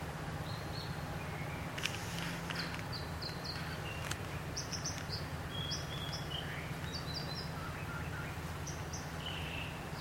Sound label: American Dipper; birds